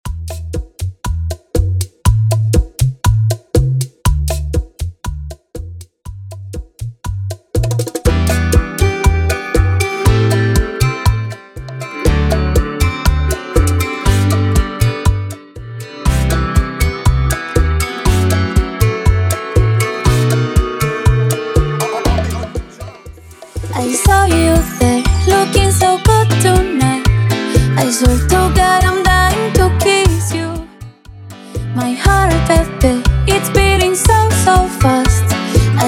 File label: Transition Bachata To Merengue 120BPM; Latin genres